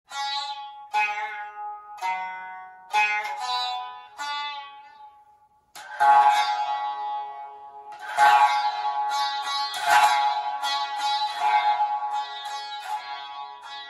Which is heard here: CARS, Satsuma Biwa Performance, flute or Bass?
Satsuma Biwa Performance